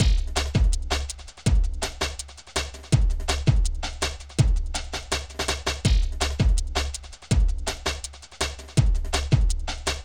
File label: Spooky Jungle; ft2